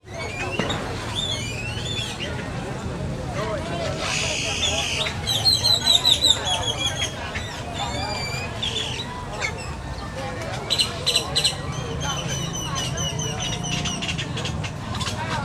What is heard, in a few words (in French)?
Oiseaux Granada